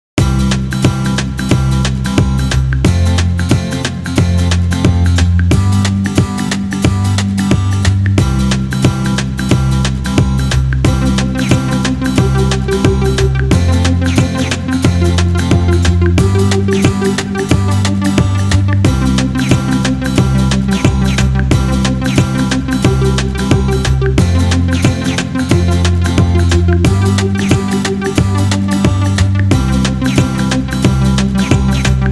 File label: Frogs